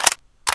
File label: lr300 mag in